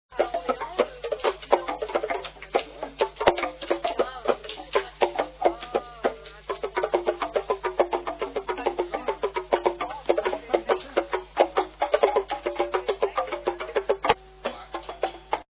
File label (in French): tambour